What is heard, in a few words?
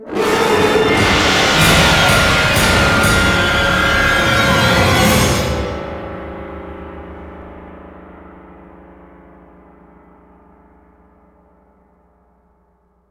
RN Stinger